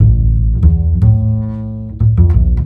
ACID JAZZ

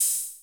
cymbal, Roland MC-909

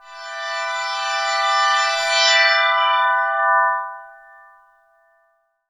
Underground UK House & Electro, Pads; Sonic Foundry (Sony Creative Software)

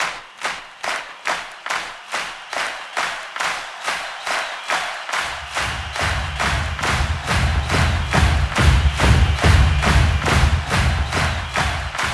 Crowd Effects

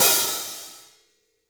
hihat - opened; JazzFunkKit

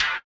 BUZZER; Spectrasonics; Metamorphosis

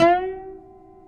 OUD G; Guitars; Spectrasonics; Hans Zimmer Guitars VOL-2

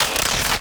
Glitches; Antidote Advent

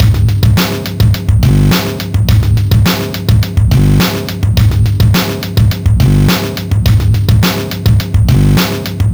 105 Hip Hop, live breaks

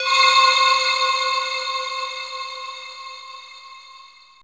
CHING; ATMOS

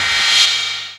D+B

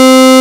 sync FM pads; Zero-G Ambient 1

